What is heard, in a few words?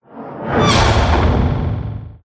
whoosh; Audio Mangler